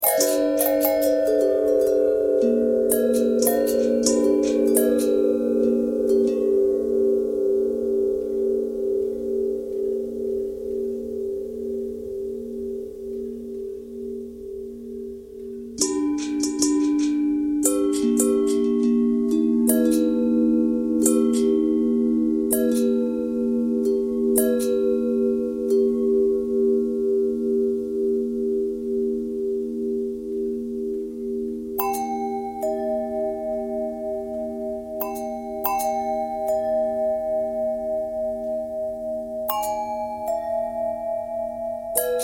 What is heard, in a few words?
long bell thread noise, bells